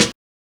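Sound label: snare drum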